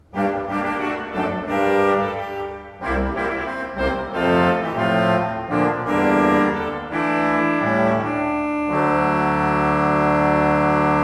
Beckerath, gebrauchtorgel

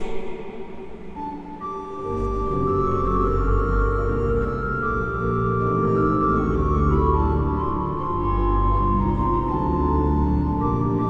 Orgel, pfeifenorgeln; Klais

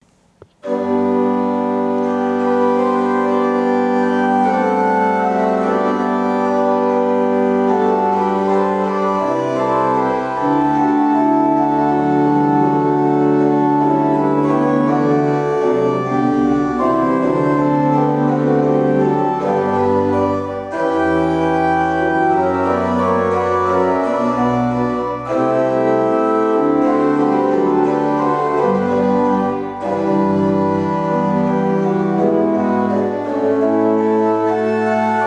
Breil, gebrauchtorgel

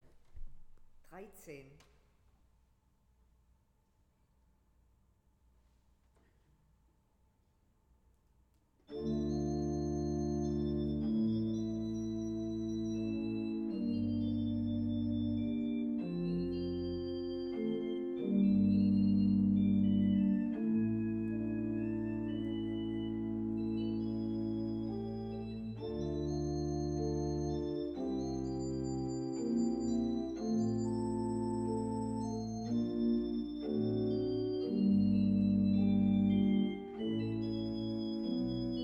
Weigle, gebrauchtorgel

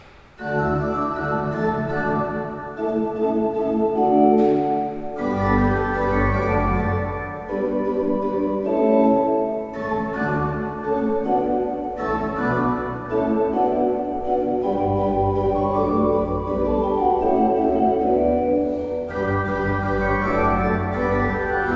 Hammer 22 II P, orgel

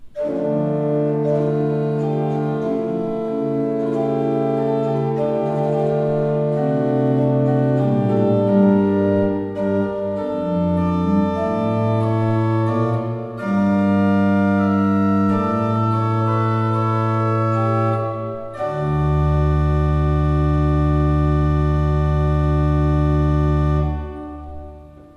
Fuehrer, gebrauchtorgel

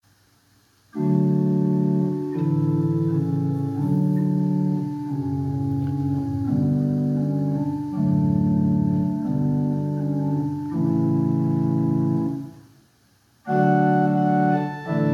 Seifert, pfeifenorgeln

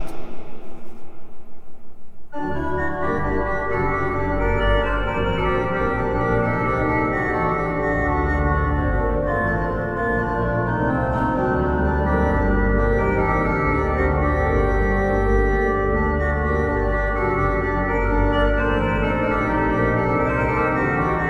Orgel, pfeifenorgeln; Klais

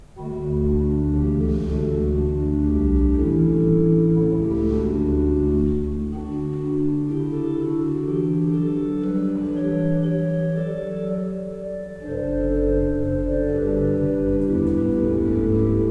Breil, gebrauchtorgel

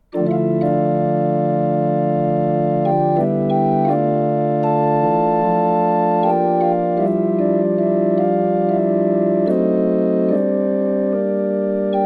Schmid, gebrauchtorgel

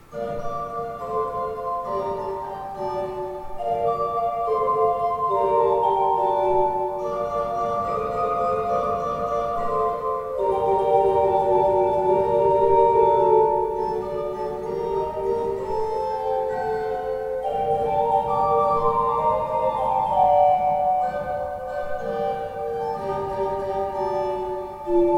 Hammer 22 II P, orgel

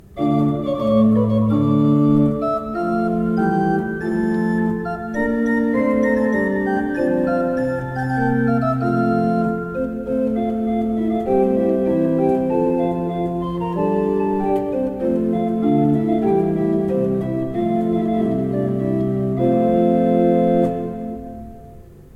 gebraucht orgel; Kemper

